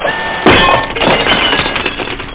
Claw Hitting; Kongbots 1.0; Robots; Strategy

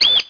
slider; Microsoft Graphics Studio Greetings; Microsoft 1998

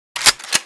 shotgun rechamber, weapons foley; Spearhead Demo; MacFormat 2003 June

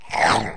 Chomp; Bugs Bannis; Squish 2.6.8

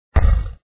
Ball Hit Stone; cyballs

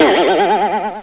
Bounce; McPoet 3.1

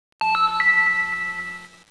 echobell; ARENA 1.6.6

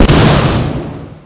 bazooka fire; Spearhead; weapons

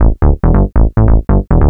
SNTH BASS TEKNO 140 A, Techno, Bass

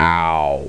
ow; A-10 Attack! Cuba Demo

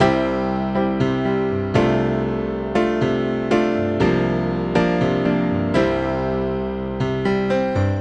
Piano Mellow, hold music; Gizmo Project 4.0.4

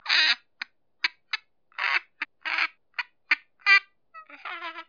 gorilla

